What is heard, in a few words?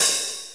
open hat sound g key; open hat samples